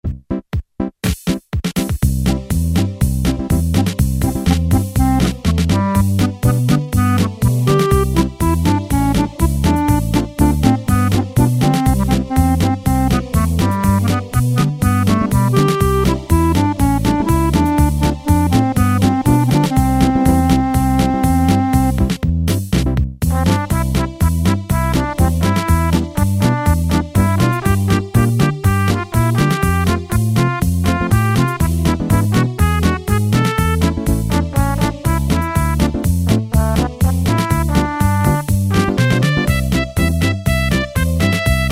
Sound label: march, Technics SX-K450 Automatic Rhythms